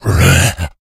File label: become alert, infected